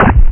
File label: droid shoot; DroidWars3